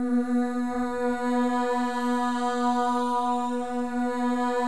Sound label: MOVING V2, Symphony of Voices; Spectrasonics